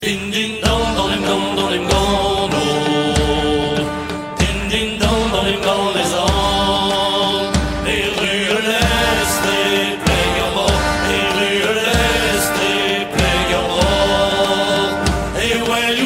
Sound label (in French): musique bretonne ringtone